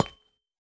铜踩踏声

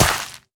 可疑的沙砾踩踏声

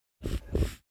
嗅探声; 嗅探兽

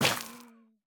灵魂土破坏声

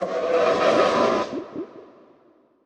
骷髅马水中嘶鸣声

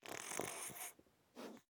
狐狸睡觉声

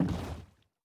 船在陆地划桨声

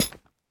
锁链踩踏声

